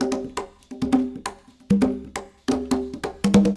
CONGAS, Funky Ass Loops; East West

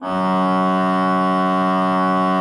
F2, Cathedral Organ; ILIO; Propeller Islands

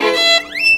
VIOLIN LINES; Best Service; World Colours

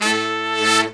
BRS SHRT, R&R Horns, Sect; Roland L-CDX-03

